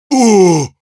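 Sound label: Heavy pain sharp, Russian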